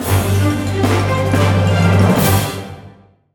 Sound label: Challenge Start; wild west guns ios wii gamerip 2008